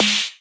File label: edm snare